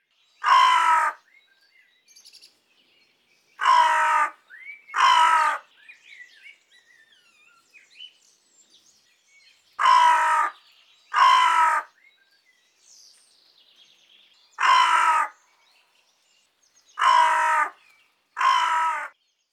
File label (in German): Nebelkraehe Corvus cornix